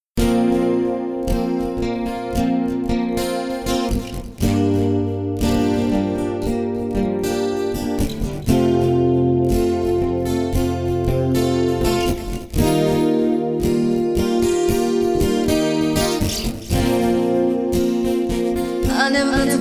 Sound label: Acoustic